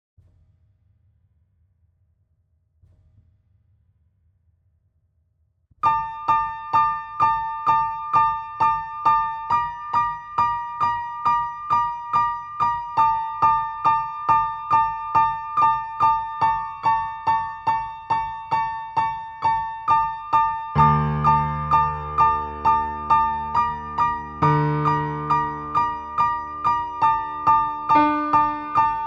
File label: kind of horror